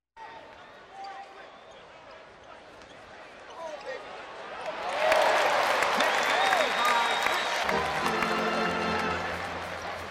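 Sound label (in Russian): sirena, basketball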